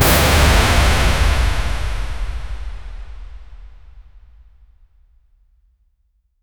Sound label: a quick cinematic whoosh